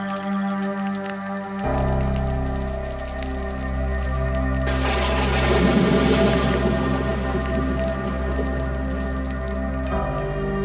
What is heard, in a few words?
Roaring Thunderstorms; Nature Sounds - With Music